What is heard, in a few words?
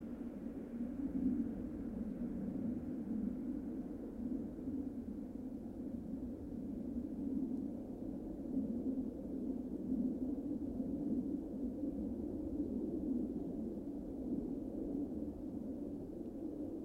yamanakako sound